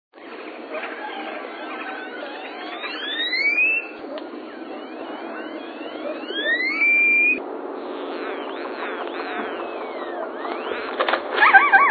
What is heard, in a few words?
grackle, birdsongs, belize